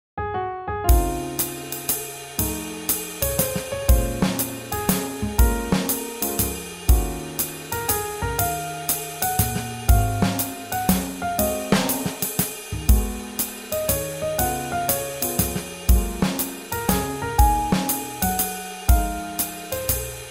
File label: Swing Jazz